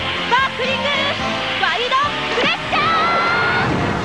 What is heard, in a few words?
sparkling wide; Sailor Jupiter; Sparkling Wide Pressure